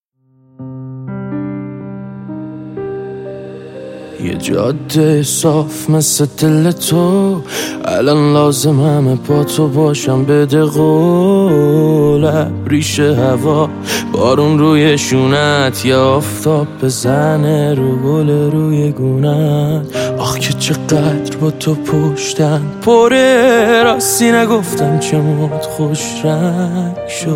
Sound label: Piano Version